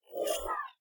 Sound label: popup down; ui; yingyan; lineageos